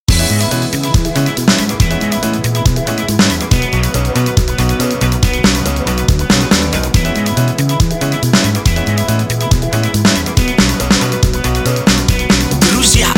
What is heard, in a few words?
rock